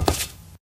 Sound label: black tile, footsteps